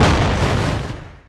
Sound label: space platform explosion; Factorio